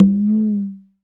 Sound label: L CONGA, CONGA